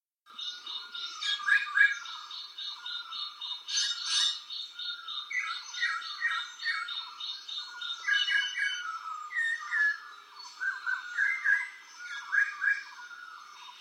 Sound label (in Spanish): Noche de ranas metalicas; Metalic frogs night; colombia